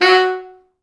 F#3, Pop Brass; Best Service ProSamples vol.19; AKAI

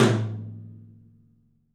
OPEN TOM; 3D Sound; 3D Drums; 12 TOM

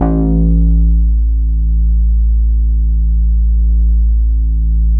BAS A1, 2 Step; USB Soundscan vol.51; House Side Of 2 Step; AKAI; BASS

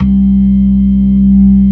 ORGAN, NO PERC; C3; Keith Emerson Signature Series

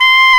SAX TENOR

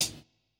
Kit, breakcore Drum Hits

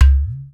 UTAR, Definitive Percussion

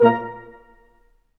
BRS Quintet stac; Roland L-CD702; Quint.Stacc

